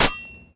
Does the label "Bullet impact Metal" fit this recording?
Yes